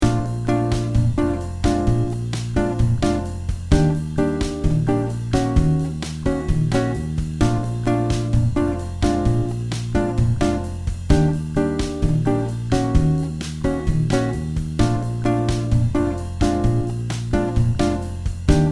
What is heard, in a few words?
bossa nova